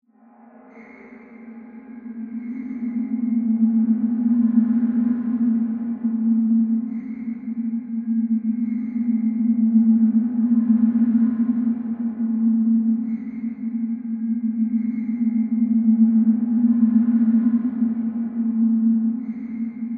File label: Bed Howl Metallic, Production, Element, Bed, Howl, Metallic, Creaks; BlastWave